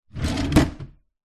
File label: office drawer wooden open or close